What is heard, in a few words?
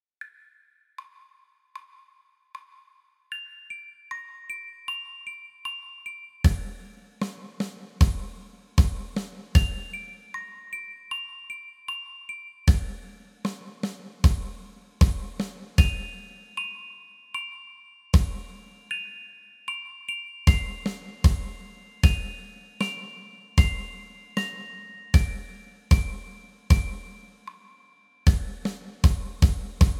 percussion showcase